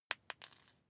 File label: paperclip drop floor